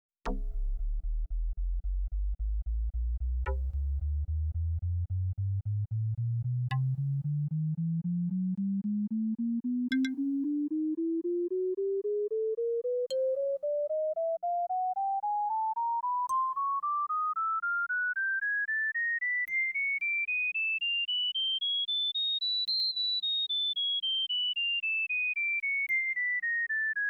Chromatic Scale (left channel, then right channel); Stereophile Test CD, Vol 3